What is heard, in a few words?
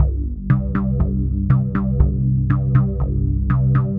Glider Bass, Bass Foundations; Sonic Foundry (Sony Creative Software); The Electronic Music Manuscript Collection